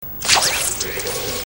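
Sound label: Web, Very Quick Sound Of Flicking Open His Web; Spiderman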